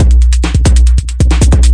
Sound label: 138 bpm, electro thump, drum loops and breaks